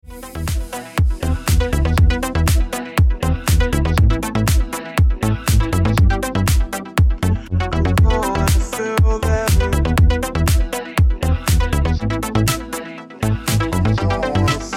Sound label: Remix Ringtone